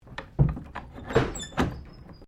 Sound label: Train Carriages 1937 Door Open; Bellarine Tourist railway vehicles; Trains